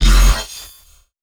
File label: Growl F, bass